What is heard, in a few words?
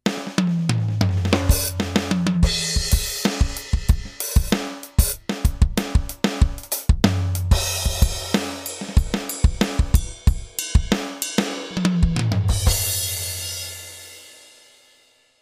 kit